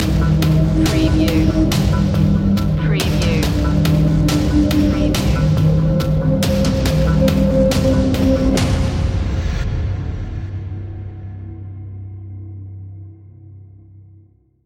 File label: calming storm